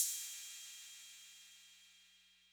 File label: Cymbal Accent Mid Decay, 808